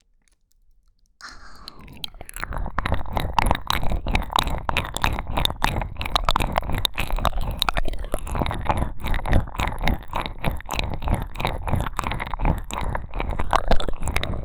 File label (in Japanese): ぞりぞりコース